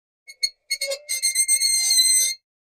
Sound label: Metal Scrape On Metal